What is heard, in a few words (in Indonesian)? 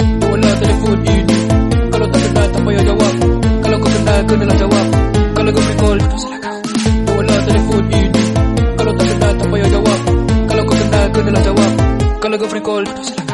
Nada Dering Inggris Nada Dering Rap